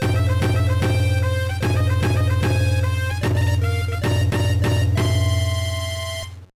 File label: Jingle; mobile pro yakyuu kantoku no saihai; gba